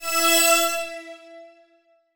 Transition Musical Tone Short; Production Element

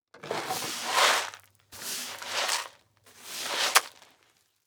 Household Broom Sweep Rock Salt

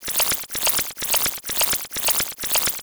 Science Fiction Sci-Fi Electronic Alien Noise

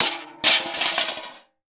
Impact Metal Object Fall To Cement; Impacts

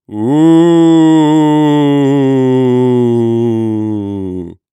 Cartoon Ghost Moan Wooho Ghost Moan; AdobeCC

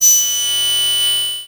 Beep Long; Adobe; Multimedia Internet CD-Rom Flash

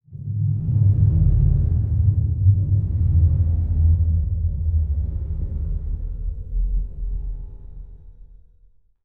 Transition Stab Dark Medium; Production Element; Title Transition; AdobeCC

